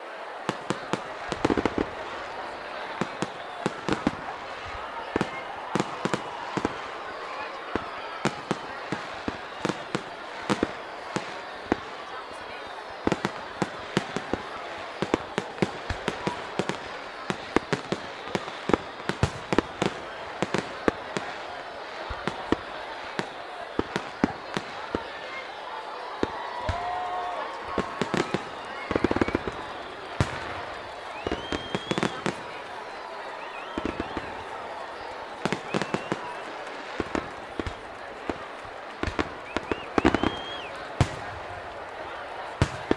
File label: Public Spaces Street Festival Ambience Fireworks Walla; InspectorJ